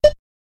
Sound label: scanner beep checkout